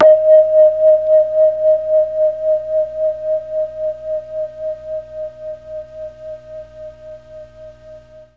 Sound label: D#3 VIBES, Mellotron MKII; Mike Pinder - Mellotron Archive CD